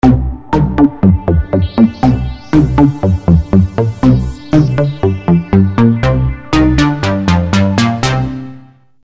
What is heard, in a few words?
Juno ringtone